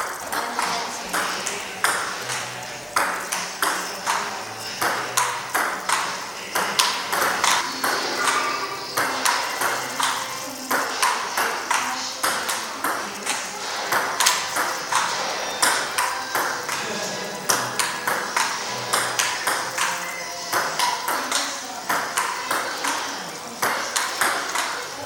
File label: pingping